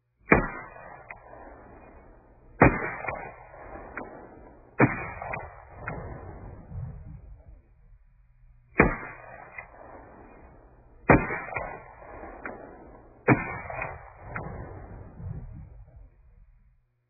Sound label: rifle shots